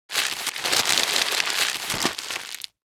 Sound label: Newspaper crumple